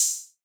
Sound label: Open Hat (Clank); One Shots; Cymatics; DOPE DRUMS VOL. 1